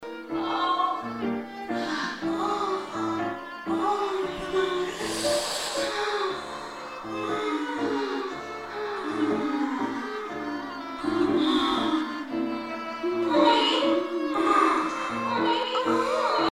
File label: habanera